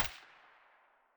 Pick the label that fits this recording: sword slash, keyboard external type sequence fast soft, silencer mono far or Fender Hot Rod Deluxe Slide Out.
silencer mono far